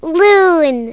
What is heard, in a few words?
LOON; animal fun 13